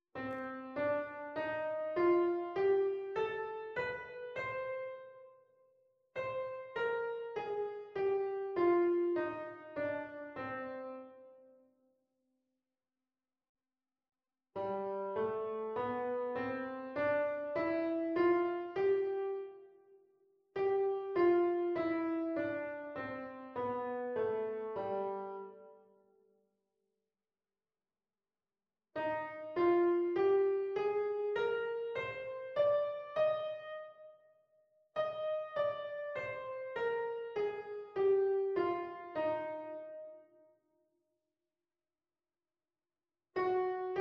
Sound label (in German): Tonleitern; Unterricht; Tonleitern bestimmen